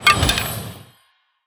Wand Bright Death Underlay

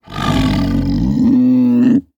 Tiger Figurine Death

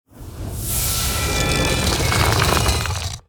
Manticron Cubes Play

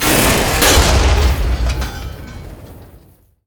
Siege Engine Death

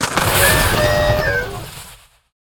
Skaterbot

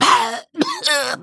Male Weasel Death

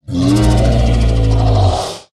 Pack Kodo Death

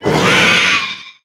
Corpse Widow Attack

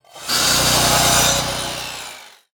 circular saw underlay attack

